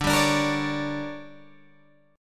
D Major 7th Suspended 4th Sharp 5th, Guitar Open G